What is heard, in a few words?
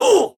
Hit Vo; Rebel